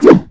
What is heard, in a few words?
raccoon attack, game; Eft